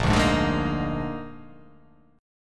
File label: A sharp 11th